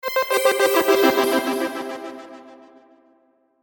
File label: Sweeper Pulse Synth; Production Element Imaging Element Accent Transition; Sweeper Sound Effects